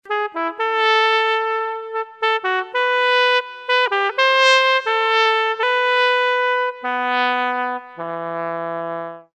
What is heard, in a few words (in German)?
Trompete